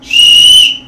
Coach Whistle

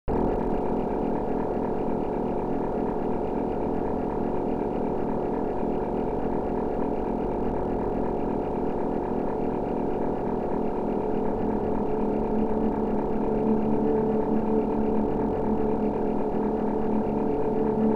Machinery

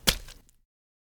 Hitting Body With Blood

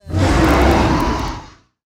Duckbill Monster Roar Short

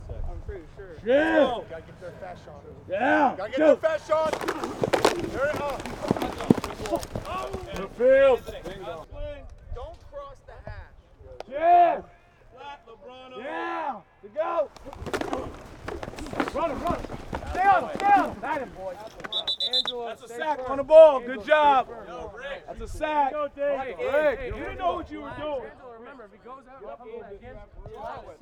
Football Practice Huddle Pad Hits Sack

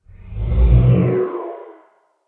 Ghosts Sweeping Passing

